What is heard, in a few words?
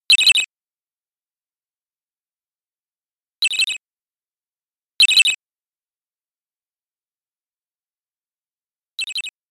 NOISE; power slave pc-9801